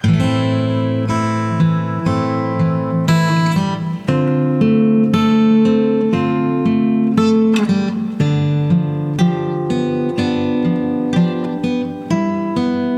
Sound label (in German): Gitarre Test; Sonar